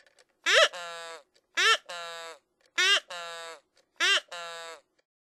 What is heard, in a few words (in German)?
esel, Tierstimmen